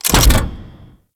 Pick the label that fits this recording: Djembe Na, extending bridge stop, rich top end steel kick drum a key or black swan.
extending bridge stop